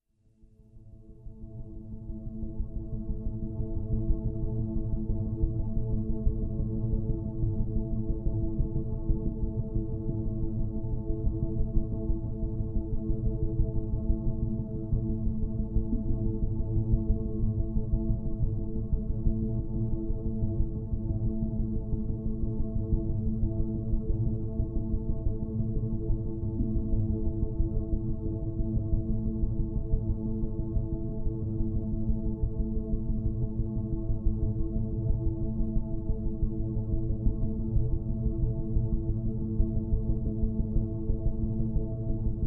Drones

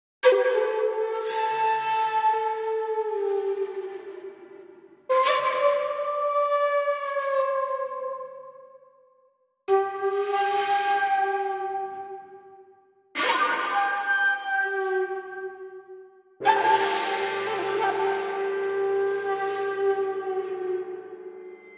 Shakuhachi and Gong; Hattori Hanzo

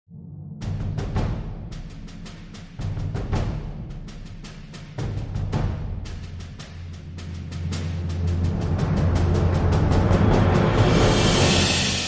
Military Drums to Big End Cue

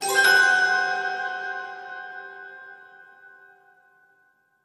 Surprise Magical Bells

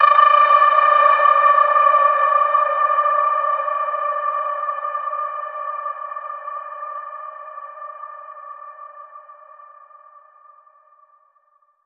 Ping Stardust

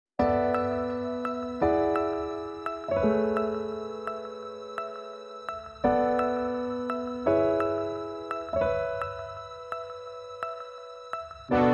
Piano Synths and Pads Only

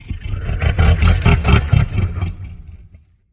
Minotaur Grunts

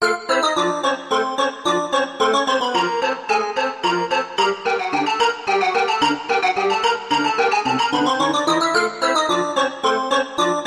Organ Circus Theme

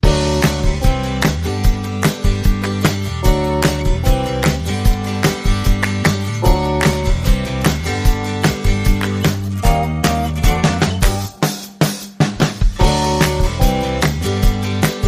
Swamp Bounce No Melody

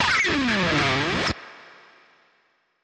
Pan Guitar Short

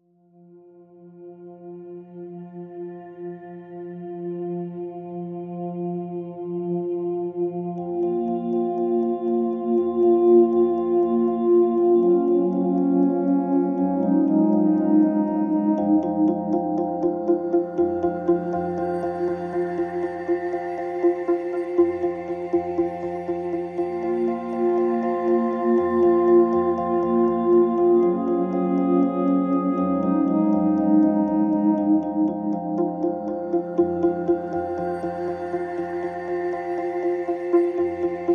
Pads and Pulses

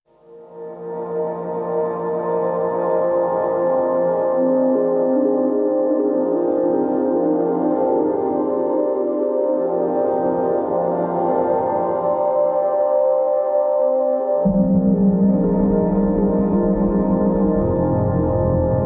Ambient

